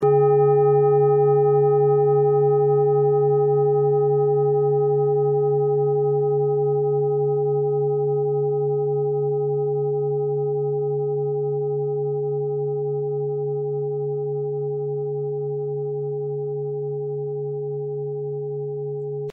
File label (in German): klangschale ladakh; Klangschale Nr.26 Bengalen; Hörprobe der Klangschale